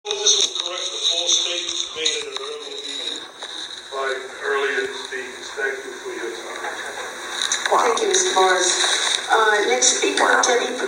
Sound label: laugh